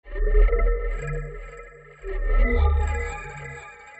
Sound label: Discordant Loop (120BPM); musicradar